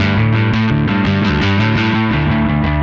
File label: Static Bass; Sonic Foundry (Sony Creative Software); Indie Rock Anthems; Session 13 - Static